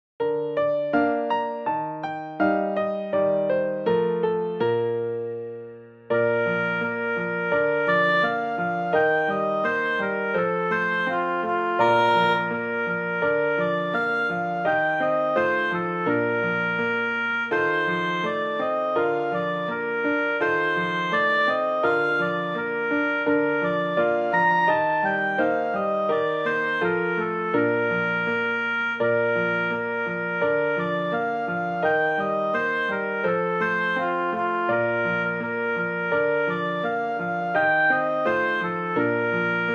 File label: oboe and piano, Traditional English Folk Song; Early One Morning